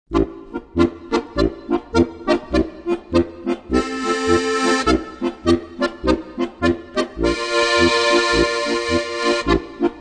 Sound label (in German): polka